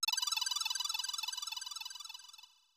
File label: Magic